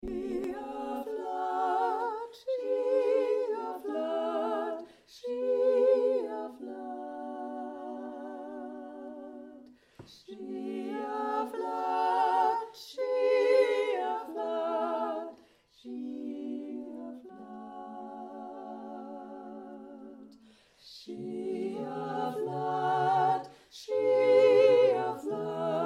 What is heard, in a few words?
Harmonic Temple Lied